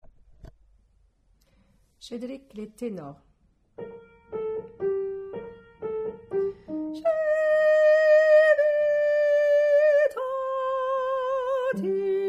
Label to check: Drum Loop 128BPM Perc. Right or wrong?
Wrong